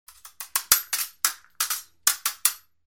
bystrye udary protivnikov

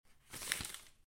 odno bumaga

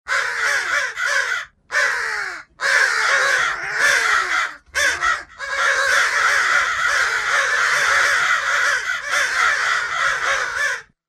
vorony gnevno

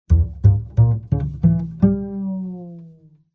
v chem to ulichili kontrabas

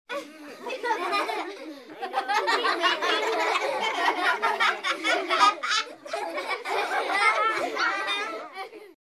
smeh detej v tolpe

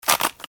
sheja povorot

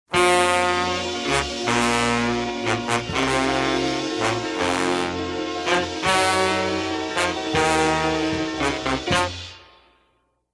jepichnaja muzyka dlja jepichnogo sobytija komichnaja

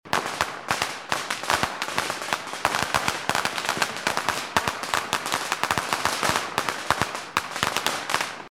tresk ogna i razryvov v vozduhe; Звук петард

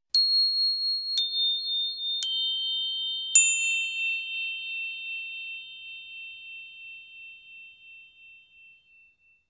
zvuk bleska po nishodjashhej

